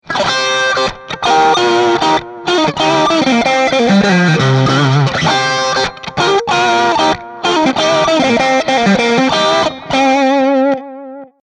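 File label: Licks Mid Bridge Single, guitar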